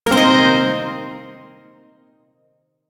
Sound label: Shawzin Blues Chord; Warframe; Duviri Shawzin